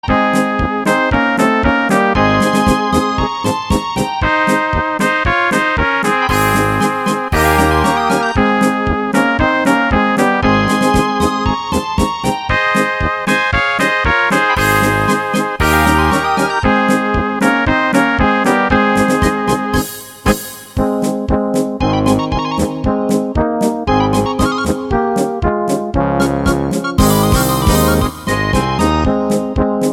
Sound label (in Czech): Polka